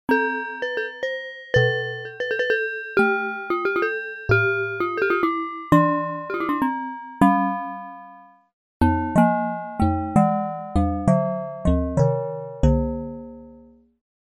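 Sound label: ping mar bell bright layer, Chromatic hits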